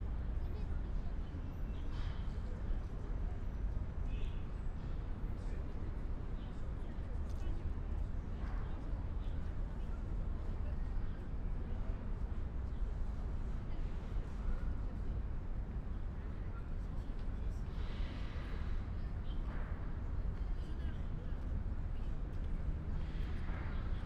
SHANGHAI park sparse voices chinese coughing footsteps diffuse traffic rumble birds dry; leaves falli; Sun-Smile-Island; Shanghai Ambiences